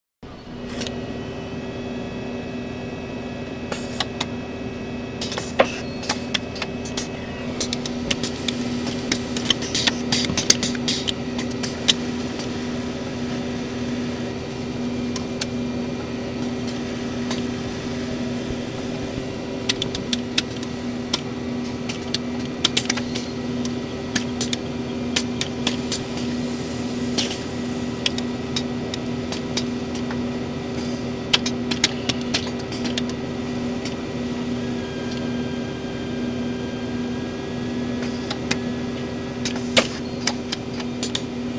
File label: Chemical Analyzer; Machine Hum, Runs With Clicks And Air Chuffs